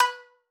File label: marimba shamisen; ゆめ2っき